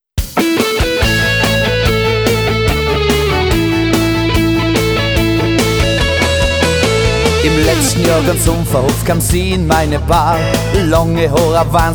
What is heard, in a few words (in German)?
Vögel Wald, das Vogellied